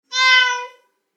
猫の鳴き声; animals

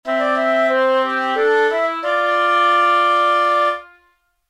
休む 休憩 寝る 笛

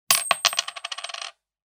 コインが落ちる音; 場面切り替えや心理描写; 演出効果音

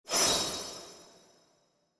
トランジション; 場面切り替えや心理描写; 演出効果音; 場面切り替え・トランジション

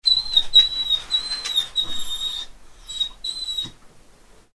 犬 鼻を鳴らす ピーピー

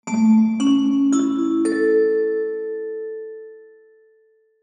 お知らせ 始まり 遅め リバーブ有り; 开演铃响; 戏剧音效

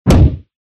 ワンドでたたく; モノラル; 武器効果音

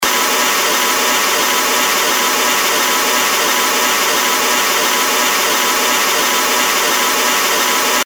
エレベーターが動く音 近未来 モノラル

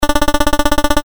会話

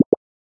メッセージ

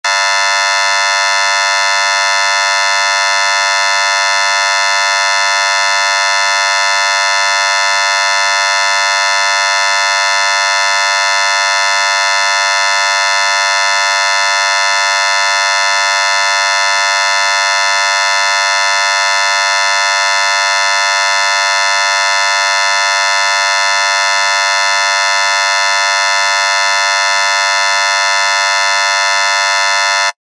劇場やホールの開演ブザー リバーブ無し; 30秒; 演劇・舞台

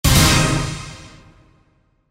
デデデン

